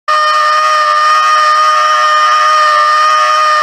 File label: running